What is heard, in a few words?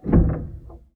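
Ped Noise Etc Single Push, Piano; Piano Miscellaneous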